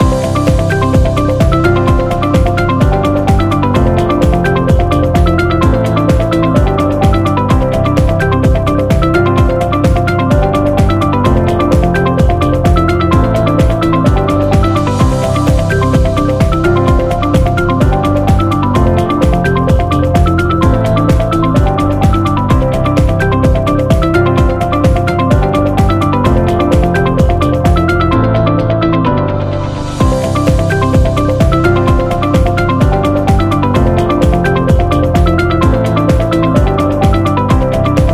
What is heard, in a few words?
Corporate Upbeat Loop; corporation